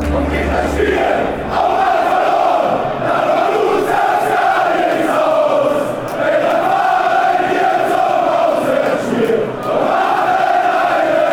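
b moenchen chant, football songs and soccer chants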